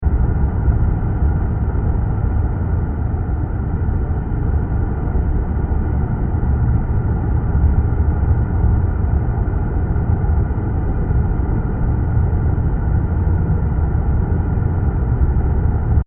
Deep Noisy Ambience 120bpm; Scary Soundscapes; Horror; Crafted Signals

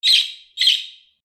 animals budgerigar chirp; Birds; McKinney Sound

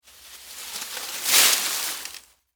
Foliage Drop Move, Palm, Frond; Texture; Rustling; BlastWave

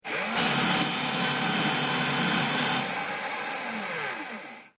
Paper Shredder; Miscellaneous Office; Offices

